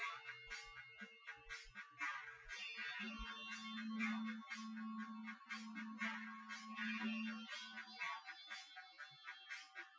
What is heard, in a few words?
wdrums synthconv 250ms 5cm; SiSEC08; SiSEC underdetermined